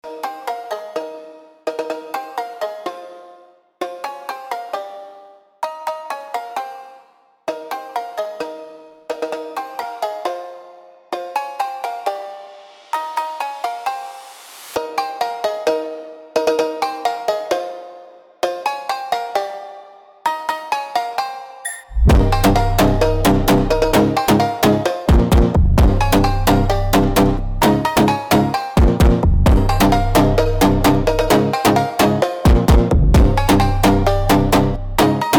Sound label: brazilian phonk jingle bells christmas; Фонк